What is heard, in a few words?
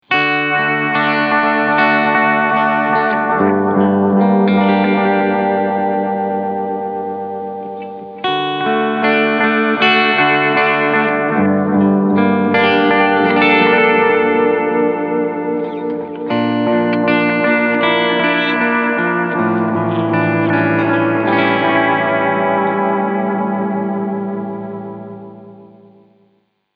Echoman Medium Delay Vibrato; XVive